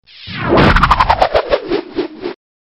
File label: spinning twirl